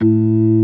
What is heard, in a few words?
ORG.3 A2; B3 FULL